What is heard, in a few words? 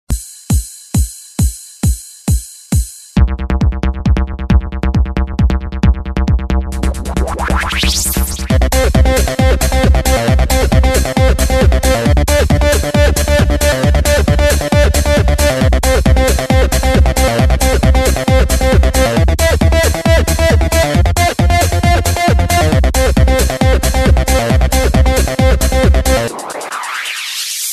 Beat Ringtone